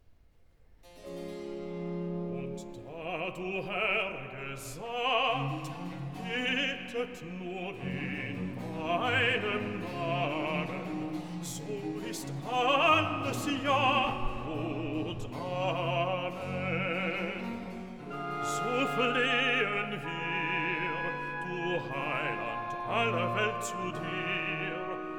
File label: Recitative Bass; Bach; Cantatas; BWV 171; Gott, wie dein Name, so ist auch dein Ruhm; Und da du Herr gesagt